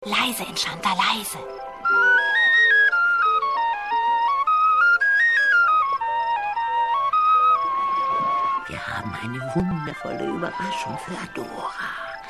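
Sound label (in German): flöte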